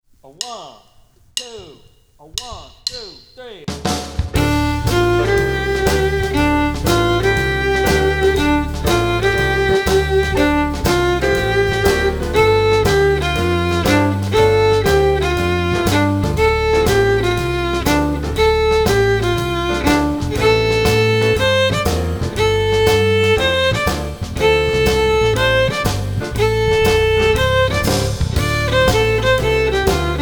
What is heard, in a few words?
Play-Along Figures On One-Octave D Minor Pentatonic Scale